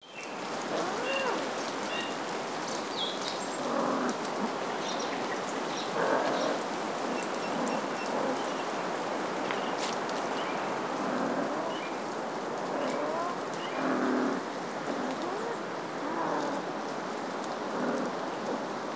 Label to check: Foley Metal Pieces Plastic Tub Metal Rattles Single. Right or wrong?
Wrong